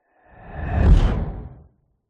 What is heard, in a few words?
fantasy magic spell zap whoosh impact dark airy